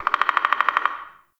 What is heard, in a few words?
Forest Animal Mid; Ambience